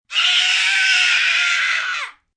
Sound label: Scream Two Kids, Scream, child, 2 boys, long, raspy, horror, fear, terror (human voice)